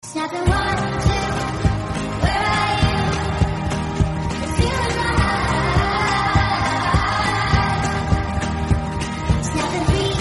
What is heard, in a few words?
hindi song